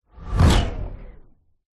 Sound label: science fiction small flying robot crash hard fast; zapsplat